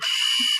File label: servo